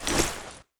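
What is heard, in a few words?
grenade throw; svencoop; weapons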